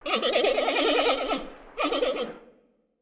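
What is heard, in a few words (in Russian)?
короткий звук панды бамбуковый медведь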